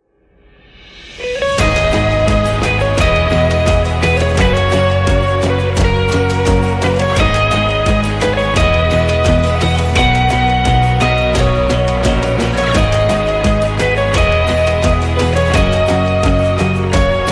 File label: Guitar Hero Ode